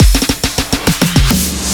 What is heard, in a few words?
short fill 138bpm